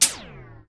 energy shield parry; Machines

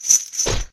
chain hit; Items hit

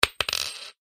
coin drop

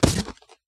cardboard box pickup; Items handling

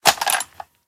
pickup, rifle; Items Weapon

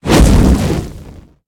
max charge fallback, Magic

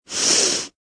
snif, Sniffing; Interactions

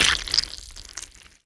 bloodloss runs; OldThink

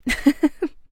laugh, Voice Human Male

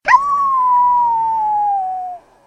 awoo; Voice Awo

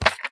ammobox pickup; Items handling

